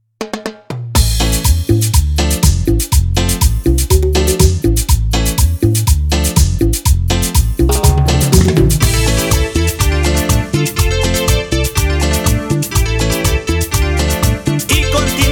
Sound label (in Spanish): DIMECION CHICHERA INTRO, BPM 121; DIMAX DJ MIX